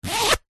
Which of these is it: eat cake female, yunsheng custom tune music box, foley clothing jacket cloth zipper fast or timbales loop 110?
foley clothing jacket cloth zipper fast